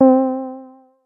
scifi; sayit

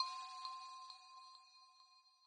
bell note H5; thetascape; gamma

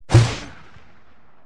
rocket launcher shoot; hygrounds

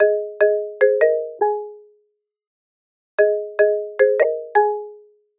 notify

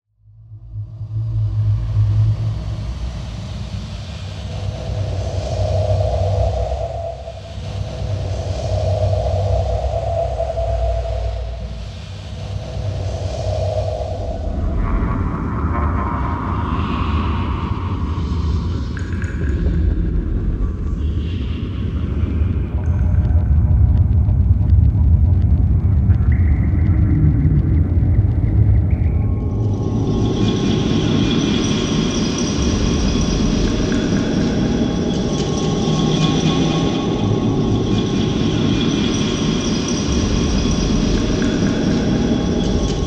ambient, prison cell

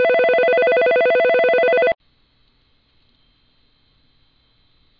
ring in, tones

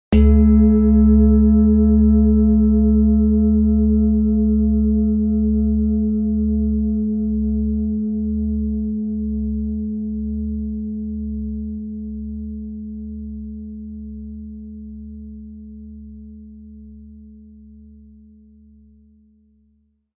bell; zen-timer

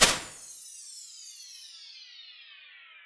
small reverb

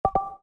speech recognition cancel

